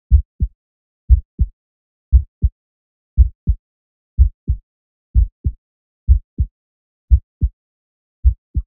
Heartbeat

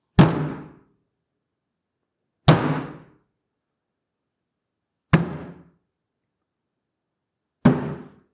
Fist Punch On Table

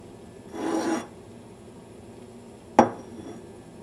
Reaches For Bowl

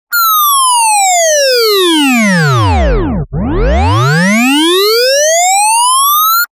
Very Digital Sweep

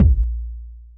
Ultimate kick

